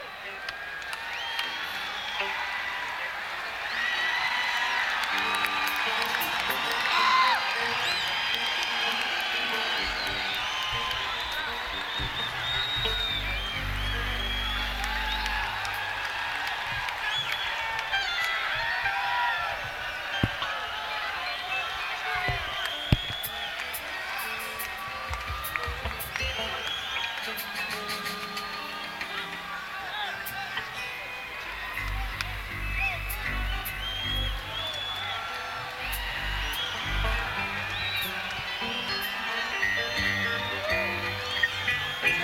Crowd Tuning; senn421

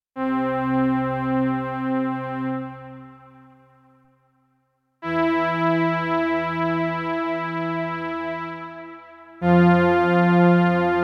Analog High; Bank 2 card A

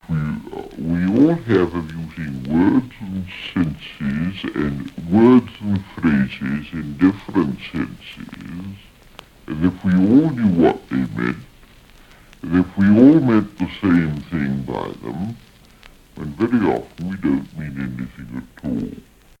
Vocal excercise

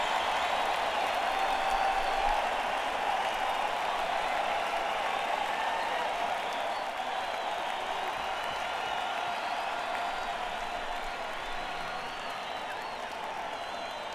Encore Break; schoeps cmc4 mk4

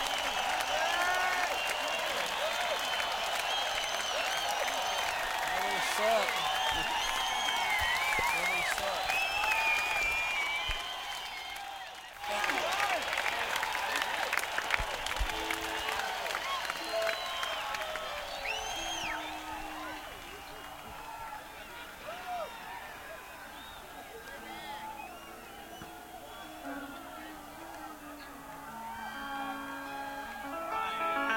Encore Break; sony ecm270

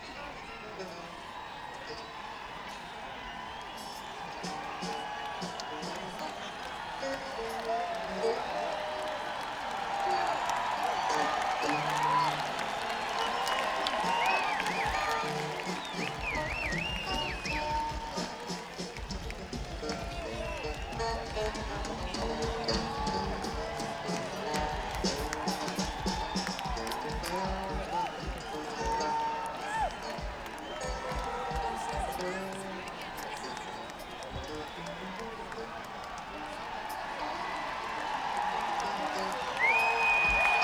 crowd; Nak300

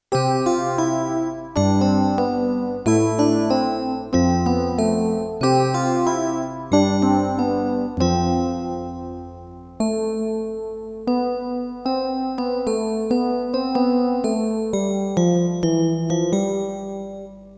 Chorus EP; Kawai K1; Presets Bank 2 card A

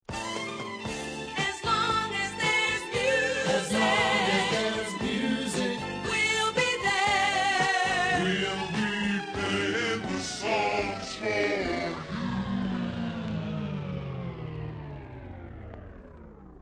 Vocal Excercise

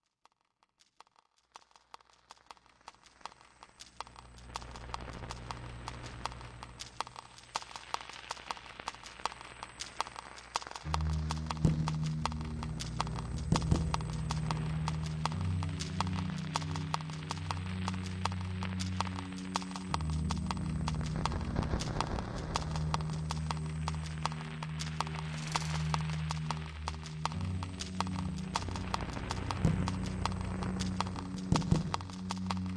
Grainy Drone

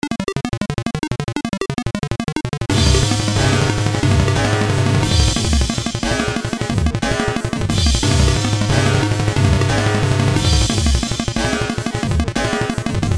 metal influenced song 180bpm version

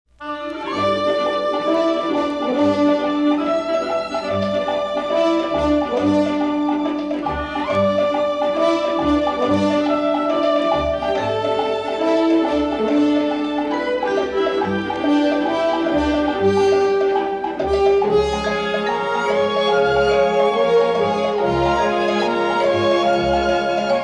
Western Song

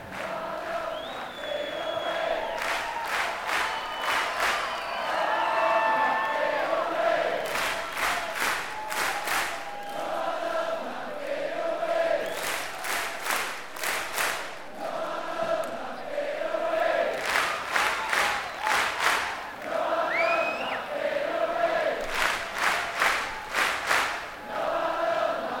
Chant; neumann km84i

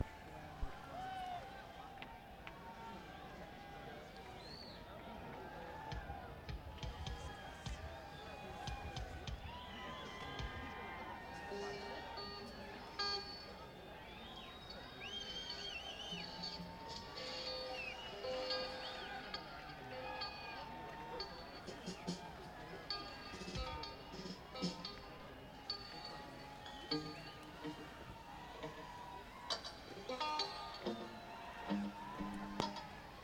crowd; Nak300

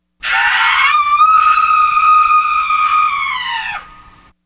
scream

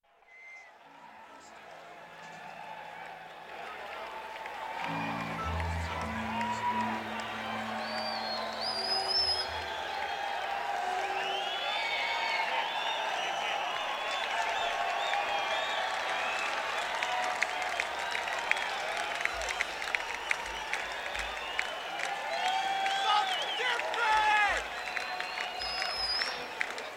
Crowd; senn421

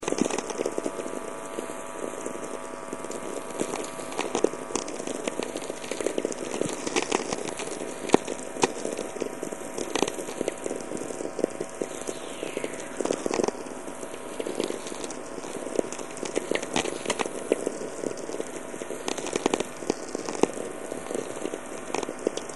whistlers, auroral chorus; london; batt park

